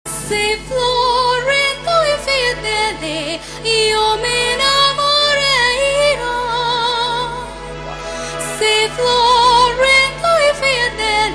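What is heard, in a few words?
Opera Singer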